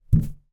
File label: foley balloon inflated land on floor